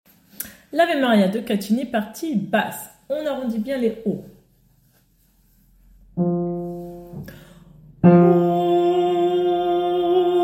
partie basse

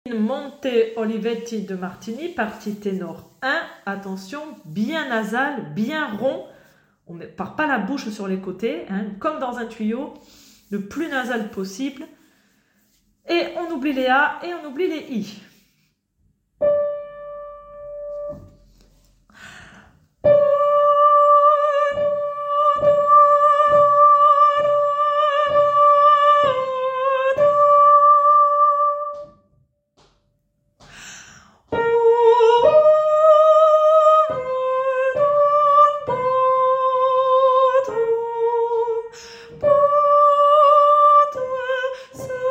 tenor; In monte olivetti

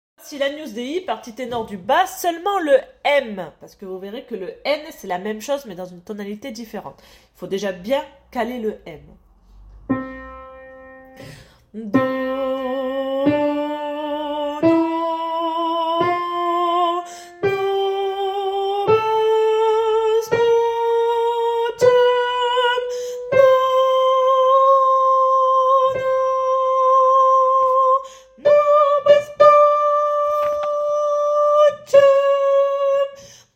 tenor bas; Suite agnus dei M

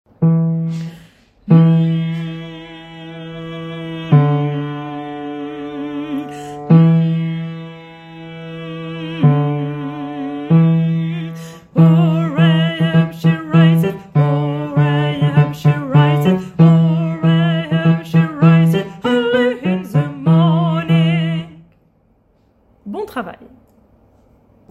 basse; What shall we do

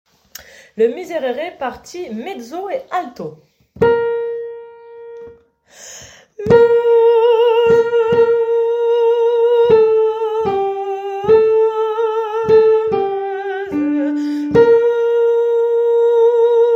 Alto et Mezzo

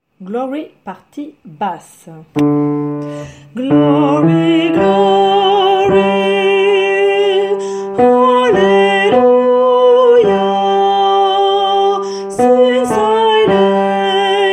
Basse

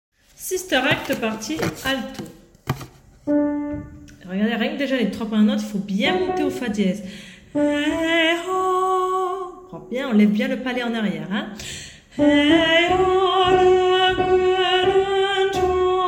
partie lente partie alto

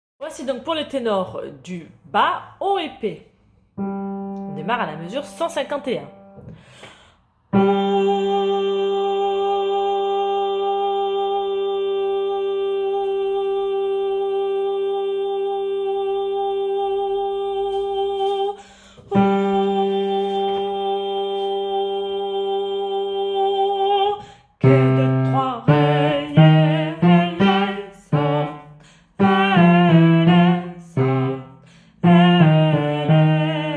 Kyrie tenor bas

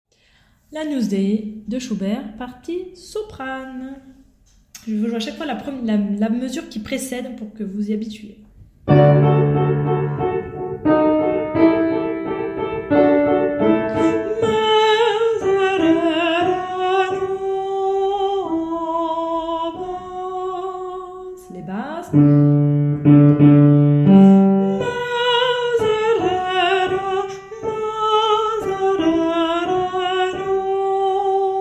partie soprano; Agnus dei

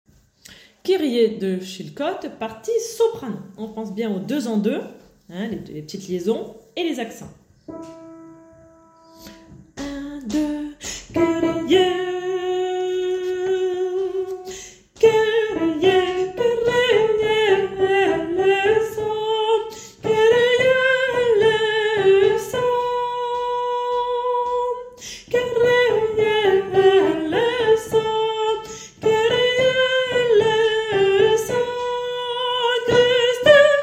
soprano; Kyrie

